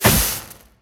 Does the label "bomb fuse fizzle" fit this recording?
Yes